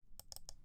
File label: Double Click Mouse